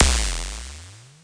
gliss down up, Electro FX; UNUSUAL KITS and FX; Atomhard Electro FX

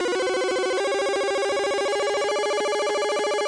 refuel, video games

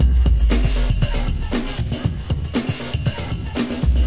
too much technology break, 118 bpm

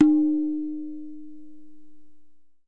backwards tu, Tabla; Small; ETHNIC and WORLD PERCUSSION

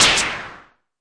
Arturia MiniBrute noiz

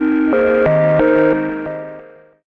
crunchy pretty synth; REMIXABLE COLLECTIONS

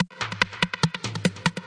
144 evil percussion; 141 to 160 bpm

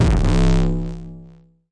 nasty stormfront; Nasty Stormfront Electro FX

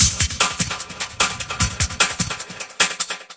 150 filtered down, drum loops and breaks; 141 to 160 bpm

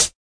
hat; Hats

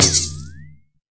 Processed Ethn Perc, Doru Malaia Percussion

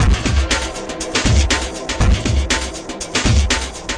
120 bpm deep hard atmospheric

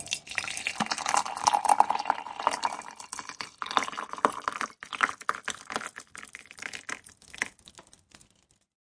Water Pour; UNUSUAL KITS and FX; ModeAudio

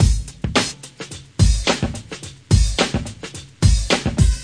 108 bpm, tricky quadsnare

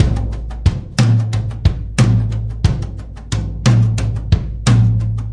90 bpm, off center big drums

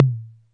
Korg KPR-77, drum machine

